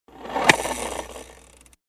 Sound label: Manual engine failure ignition